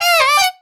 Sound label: male hit